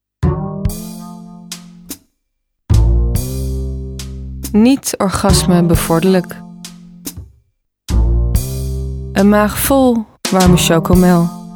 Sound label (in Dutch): orgasme